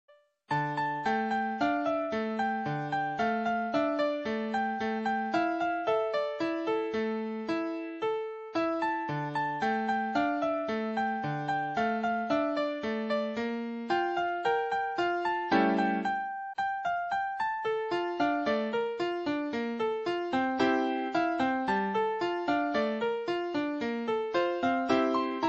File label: piano